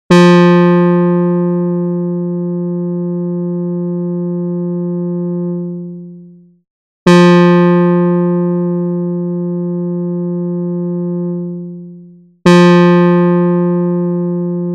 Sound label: ADSR